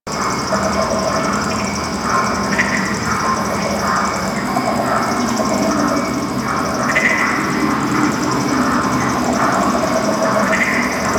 Forest Exterior Night Marsh Amb; Warframe; Ambience; Grineer Forest

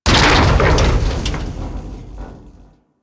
Ship Hull Impact Large; Hit Effects; Warframe; Railjack Hull Hit Effects

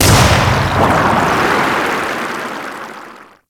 Ocean Metal Tube Pod Launch; Ambience; Warframe; Grineer Ocean

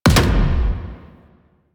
Sniper Alt Fire Punch; DE; Warframe; Frumentarius; Exalted Sniper

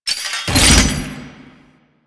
Fall Knees; Foley

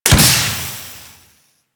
Pistol Projectile Explode; Weapons; Warframe; Tenno; Guandao

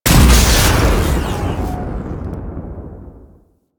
Terra Vent Explosion; Warframe; Corpus; Arachnoid Camper; Death

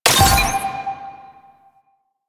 Scan Pillar Burst; DE; Warframe; Frumentarius; Scan Ability

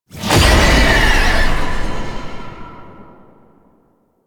Unyielding Gallop Impact; Warframe; Dagath

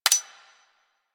Tommy Gun Mech; Weapons; Warframe; Tenno

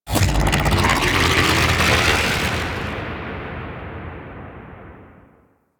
Void Tower Capture Started; Warframe

